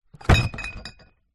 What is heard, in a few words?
beer bottles full in cardboard carrier set down on ground; zapsplat